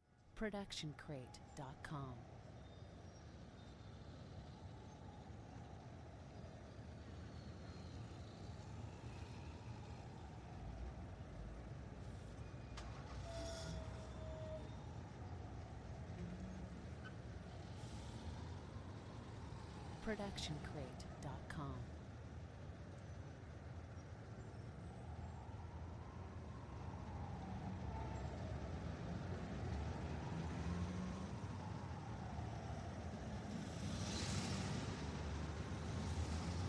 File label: city ambience; soundscrate